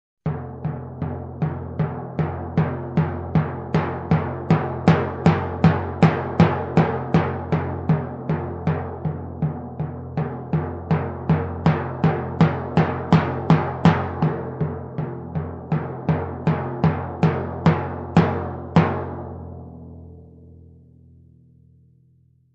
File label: Timpani increasing and decreasing intensity